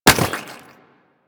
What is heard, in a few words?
Chem Strike Nox Rifle Projectile Impact